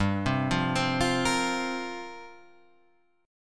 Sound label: F Suspended 4th G; arpeggiated; JGuitar